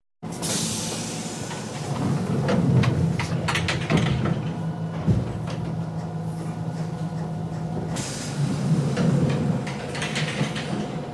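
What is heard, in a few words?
sound of a train in the vestibule; Zvuk poezda v tambure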